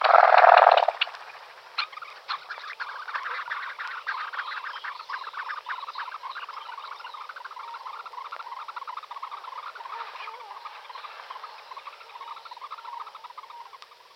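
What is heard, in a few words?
red-necked phalarope voice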